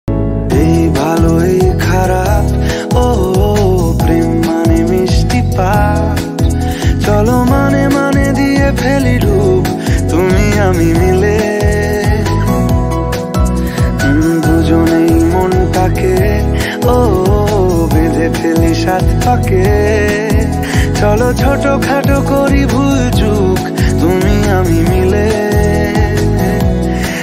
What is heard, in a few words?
bengali ringtone